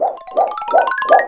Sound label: Preparing Magic Wand